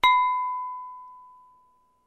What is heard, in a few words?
glass hit